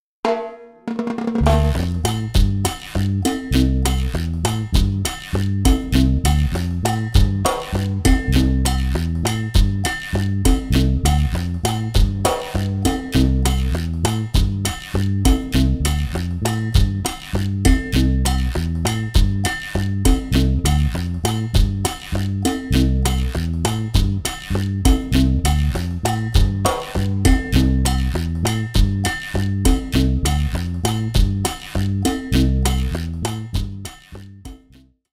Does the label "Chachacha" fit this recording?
Yes